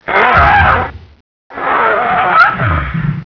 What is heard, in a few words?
penta death